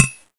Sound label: Hit; hydra castle labyrinth gamerip 2011